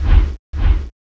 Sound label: fan; quake2; inland